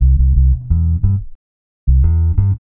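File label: reggae bass C; Best Service Advanced Media Trax Modern Composer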